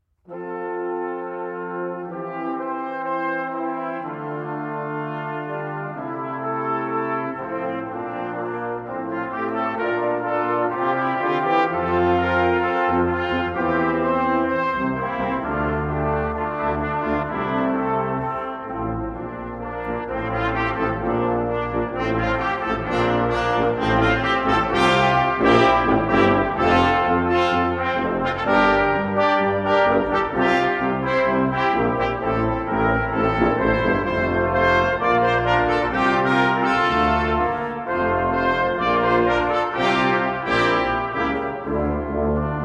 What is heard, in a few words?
brass